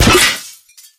robo impact soft; source engine; tf; mvm; physics; video games

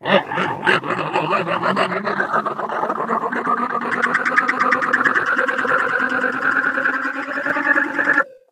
ballbot infinifling

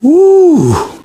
pain relief sigh, survivor voice, manager; source engine; left 4 dead 2

